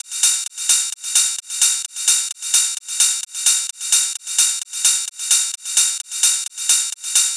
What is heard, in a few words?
Ride Loop 130, Techno; Loops; Rides; GhostHack; Ghosthack - Free Techno Sounds 2024